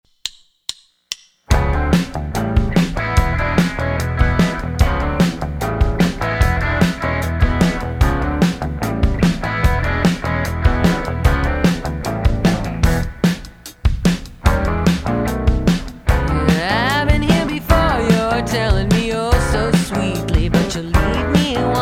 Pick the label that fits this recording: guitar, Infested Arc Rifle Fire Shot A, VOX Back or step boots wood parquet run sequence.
guitar